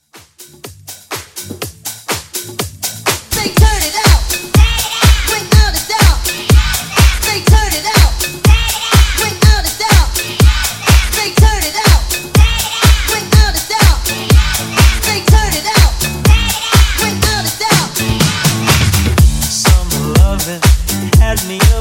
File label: Classic House 123